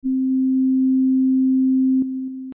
RnB Lead

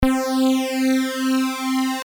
unison

